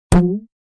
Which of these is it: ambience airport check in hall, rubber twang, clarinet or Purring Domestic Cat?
rubber twang